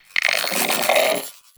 Sentient Brachiolyst Idle; Warframe

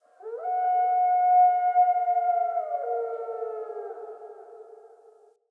Wolf Cub Landscape Howl; Orengal

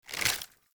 Lato Remaster Reload C, Weapons; Warframe

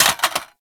Reload Drop Mag Default; Warframe; Boar

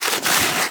Armadillo Roll Snow

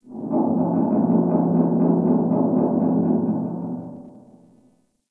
Pipe Creak One Off; Ambience; Warframe

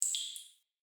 Cave Drip; Caves; Glades Of Andurin

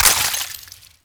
Ammo Pickup Viral; Warframe; Frumentarius; Ammo Ability

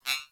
Beep Comby; Warframe; Corpus

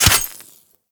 Melee Dagger Impact Robotic Flesh; Warframe; Daggers

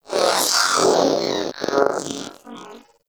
Zanuka Companion Vocal Breath Walk; Pets; Warframe

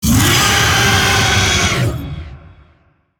Vocal Attack; Warframe; Zariman; Void Angel

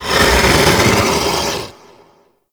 Rock Elemental Cast Vocal; Warframe; Warframes; Brawler

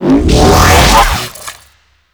Shield Up; Enemies; Abilities; Warframe; Teralyst

